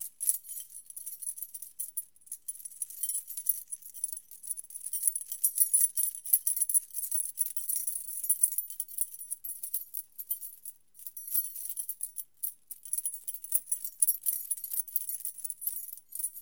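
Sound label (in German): DR-22WL Stereo Schlüssel; tascam dr-22wl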